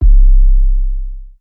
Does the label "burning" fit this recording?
No